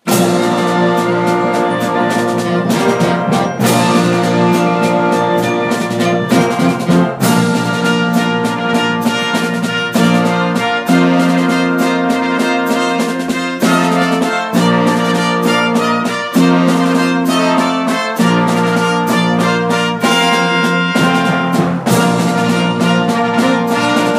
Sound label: fanfara